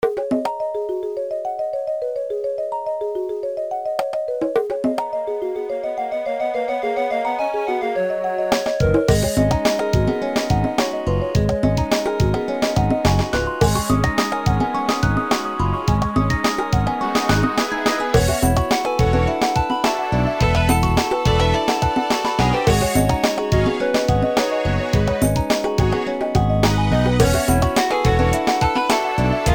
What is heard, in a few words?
jungle, bgm; PokeRogue